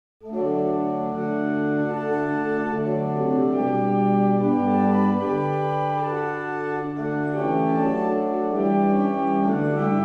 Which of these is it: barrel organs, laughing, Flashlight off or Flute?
barrel organs